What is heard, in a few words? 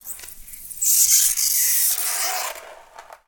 nails on ceramic tile single, fingernail scratches; 3maze; Scratch - Multi-Surface Fingernail Scratches